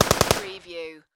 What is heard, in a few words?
Machine Gun AK-47